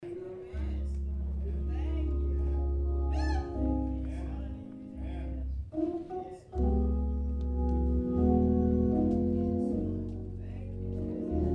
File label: Chant